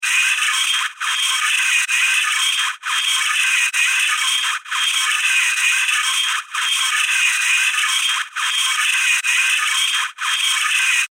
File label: Loop Intergalactic Industrial Machine, sci fi music